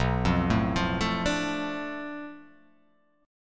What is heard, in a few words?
B Minor 7th Flat 5th B, chord; Bm7b5; arpeggiated; x,2,3,2,5,4,4,x; JGuitar